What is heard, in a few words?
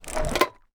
medic emergency kit pickup; war ui class specific; esmteam